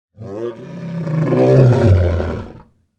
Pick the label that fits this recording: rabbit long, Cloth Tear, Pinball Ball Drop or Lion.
Lion